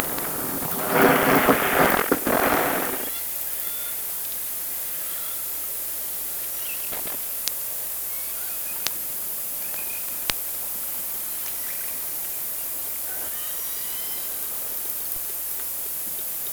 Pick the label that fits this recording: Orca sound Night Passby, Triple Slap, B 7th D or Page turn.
Orca sound Night Passby